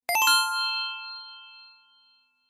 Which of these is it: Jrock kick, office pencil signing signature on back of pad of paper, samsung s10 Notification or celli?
samsung s10 Notification